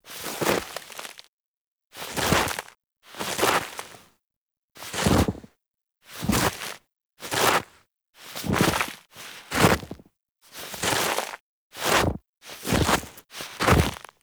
Foley Steps; Warframe; The War Within; Mountain Pass; Cinematics; Operator

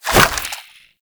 Idle, Weapons, Sentient; Warframe; Shedu

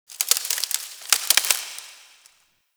Foliage Rustle, ambience; Warframe; TheNewWar; Zariman

